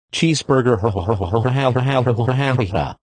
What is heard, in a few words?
hahahahahahahahahahaha